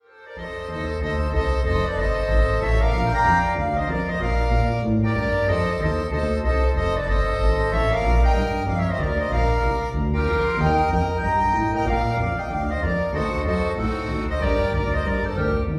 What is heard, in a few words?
American Patrol Trio Computer Sample